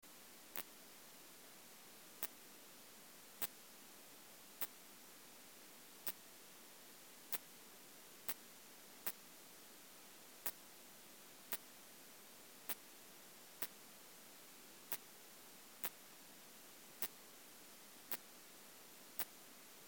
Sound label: Phaneroptera nana lab recording 26°C